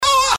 喊叫; 音MAD; 蓝蓝路

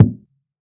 敲门 kick

